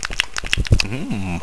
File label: Smack; SCS Snake